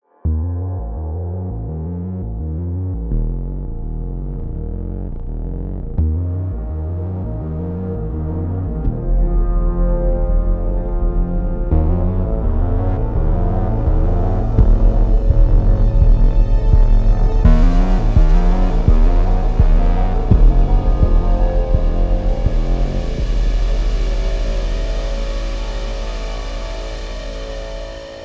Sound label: knock